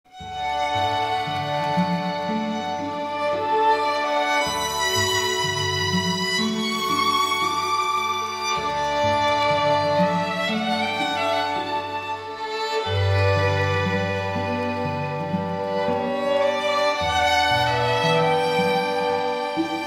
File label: Meditation; Massenet; Thais